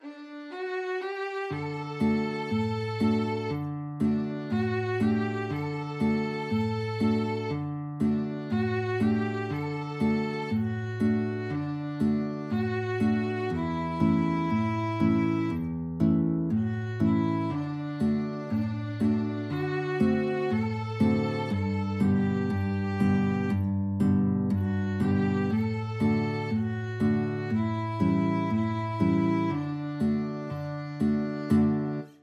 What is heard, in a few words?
VLN GUIT duet, violin guitar duet